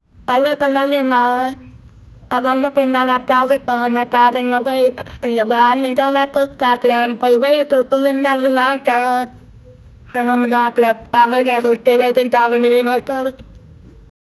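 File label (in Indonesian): nada bedug buka puasa; beduk; tap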